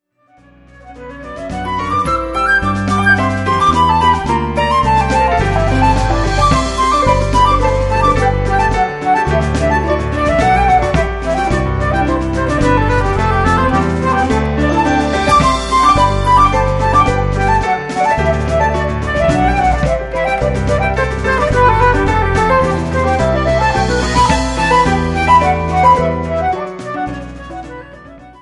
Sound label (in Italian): concerto piccolo